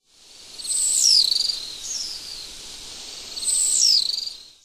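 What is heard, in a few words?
amatsubame, Pacific Swift; muroran; koe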